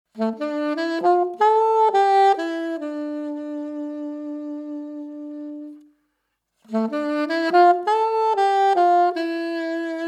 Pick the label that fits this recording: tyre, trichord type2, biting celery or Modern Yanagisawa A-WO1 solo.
Modern Yanagisawa A-WO1 solo